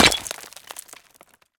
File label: stone impact; BluezoneCorp